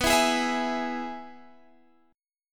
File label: Ab Minor Sharp 5th B